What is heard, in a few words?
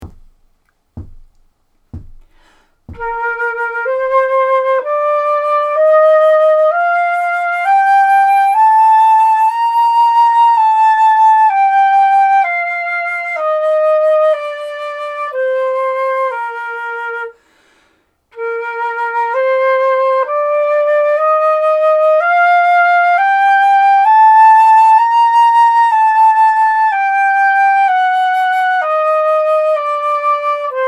Bb major g minor Eb major, flute